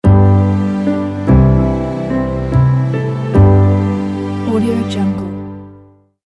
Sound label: classic piano logo